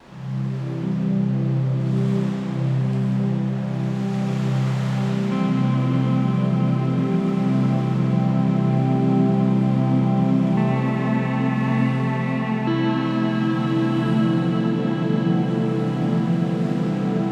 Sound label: Delta Binaural 432 Hz